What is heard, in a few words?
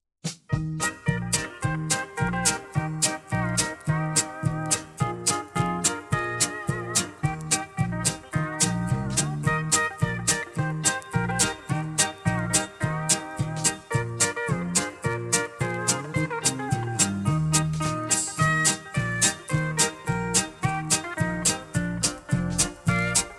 Polka